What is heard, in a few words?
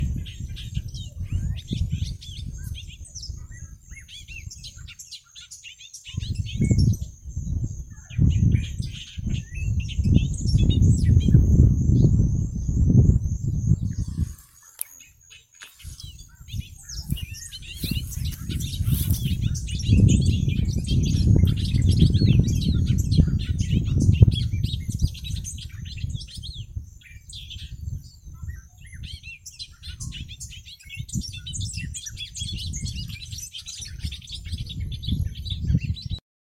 Bobolink, Dolichonyx oryzivorus; blackbirds orioles